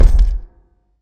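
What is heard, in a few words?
step one heavy clang, cold weapons; holodnoe oruzhie; shag odin tyajlyiy lyazg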